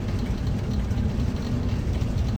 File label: extender bridge extending loop